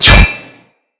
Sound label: Bolt Impact Metal; Weapons; Cross Bolt